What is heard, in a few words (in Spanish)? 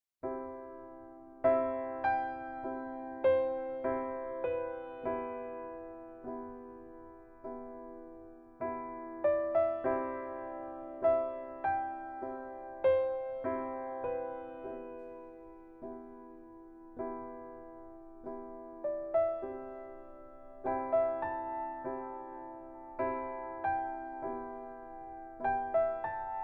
Paisaje estado de animo